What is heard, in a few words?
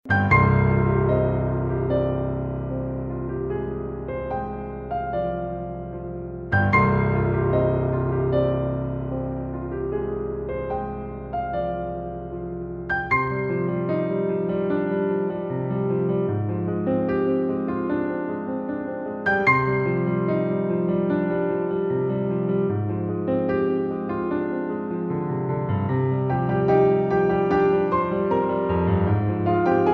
piano; mandalorian